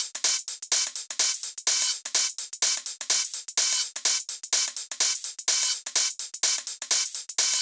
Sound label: 126BPM Pong Kit